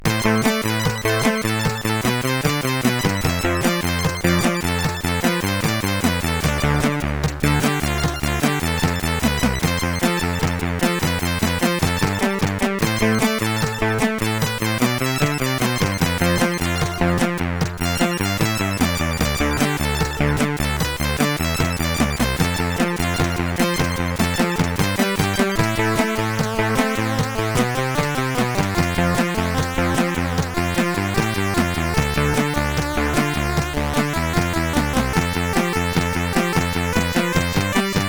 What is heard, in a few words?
Clik Clak, CSG8580R5